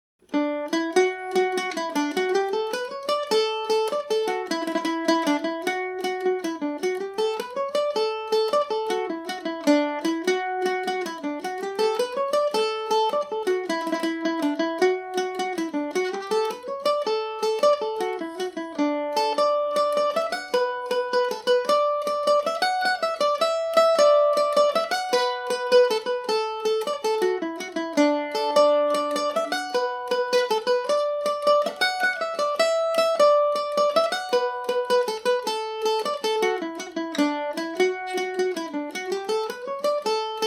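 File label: D major, irish mandolin; kerfunten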